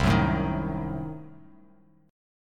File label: C Diminished 7th down Bass Standard; strummed; JGuitar